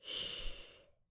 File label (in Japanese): ブレス ロング